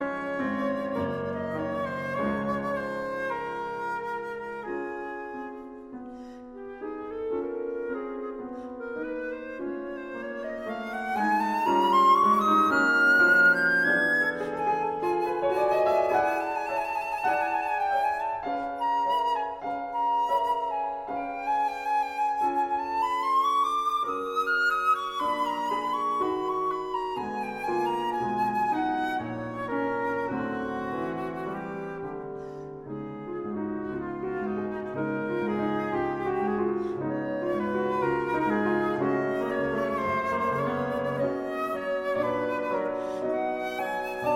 andante et allegro op 133 for flute and piano i andante